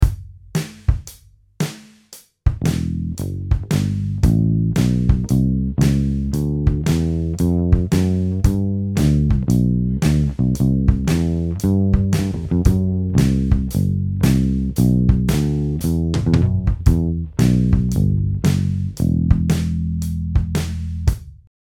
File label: Godin Finger Piezo